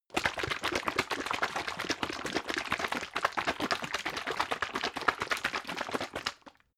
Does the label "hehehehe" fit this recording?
No